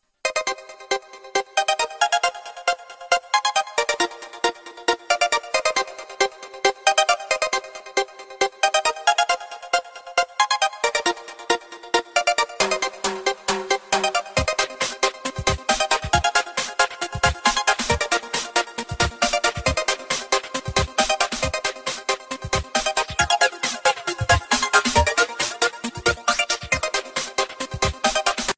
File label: Super Ringtone For Mobile